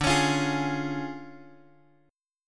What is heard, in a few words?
D Minor Major 7th Flat 5th, D; DmM7b5; {X 5 X 6 6 4} strummed